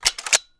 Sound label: clip out, weapons; phantom